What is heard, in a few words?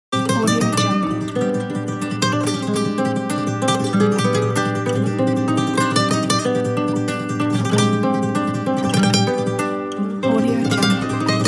passionate spanish guitar logo